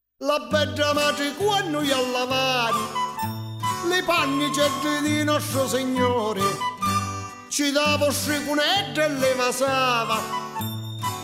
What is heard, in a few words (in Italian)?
filastrocca a lu bamminu